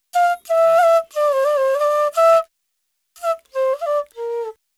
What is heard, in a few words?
ETHNO EXTRA 90; ethno2